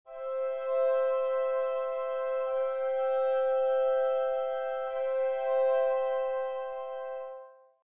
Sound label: woodwind pairs; Nikolai Rimsky Korsakov